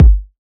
clear kick sound G sharp key, steel kick drum sample tuned to the G# note